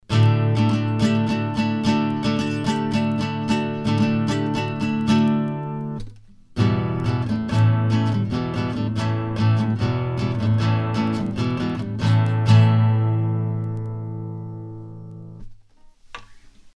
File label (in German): Sontronics STC 3x Acht Gitarre